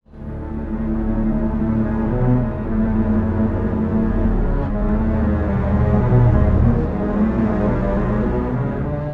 strings, pitchshift; digidesign pro tools ts

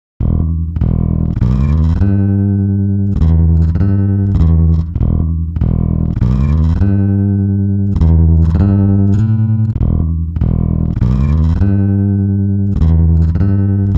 bass; timestretch

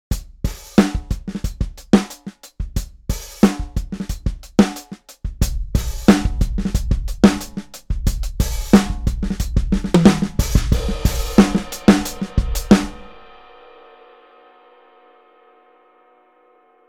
drums; quikquak; rayspace

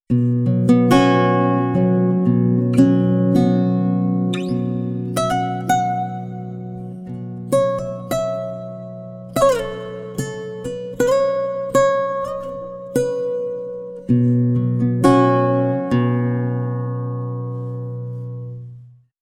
pitchshift guitar

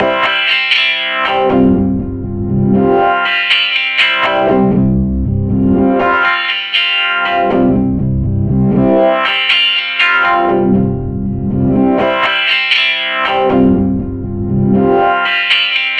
smooth sweep guitar; universal audio

